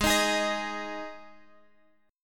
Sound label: G sharp 5th, guitar; G#5 Chord {X 11 13 13 X 11} strummed